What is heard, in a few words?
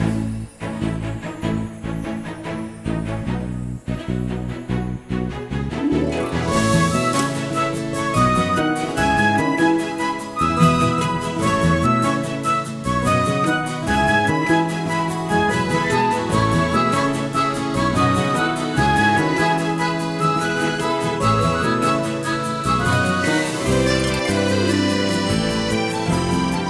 Menu